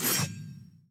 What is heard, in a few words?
SLICE